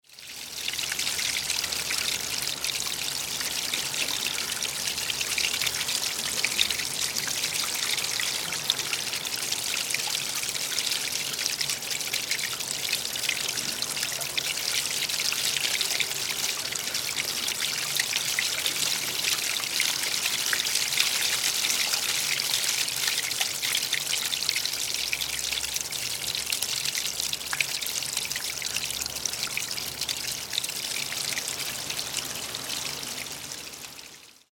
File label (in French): eau qui coule